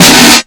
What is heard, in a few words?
k1, Kawai K1 Drum Machines; 300 Drum Machines